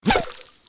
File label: Gun, Bullet Impact Into Water; gun and weapon sounds; captain audio; fully loaded